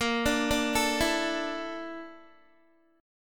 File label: Bb Major 7th Flat 5th, Guitar; arpeggiated